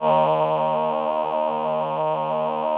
VP-330, String Machines

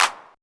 808 CLAP; TR808+909

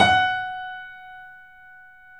F#, 3D Pianos, Boesendorfer

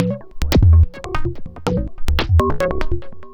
Mod Loop, Modular Percussion; Sonic Foundry (Sony Creative Software); Synchro Funk

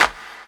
CLAPS HOU; Best Service Dance Mega Drums

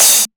Crash, Roland MV8800; HARD MM KIT

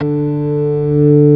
ORG. D3; B3 FULL

